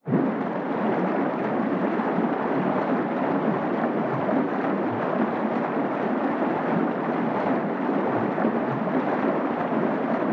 Underwater Water Splash Swim Movement Olympic Swimmers Lanes Pool